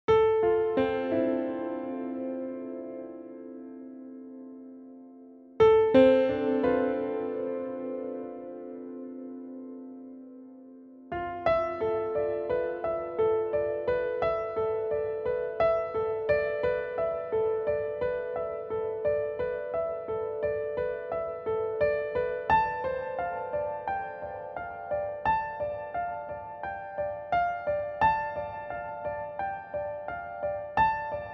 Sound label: Tension